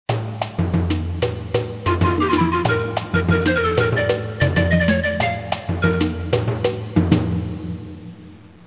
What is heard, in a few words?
Anthem; Material World - A Global Family Portrait